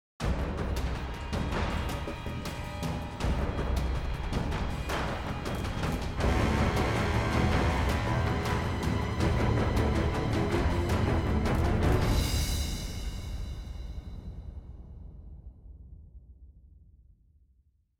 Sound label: Boss Combat Intro; Shax